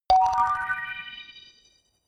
notification kalimba magic high

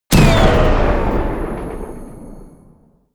sci-fi plasma cannon explode

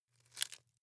wood chip small break creak

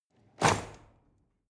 drawer cutlery open lock

